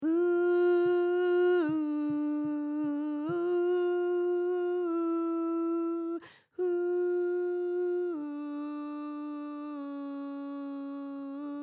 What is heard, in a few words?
Ooh Alto; JazzGloriaOohAlto